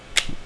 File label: discard; RS Games Sounds; Uno